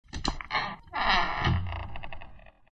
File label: door creak